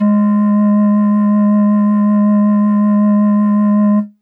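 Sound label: Pan Flute DX100 G#2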